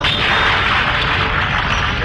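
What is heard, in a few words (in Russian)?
тяжелый бокс щебень японский мультфильм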